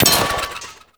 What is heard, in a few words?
Glyph Target Hit; Duviri; Glyph Puzzle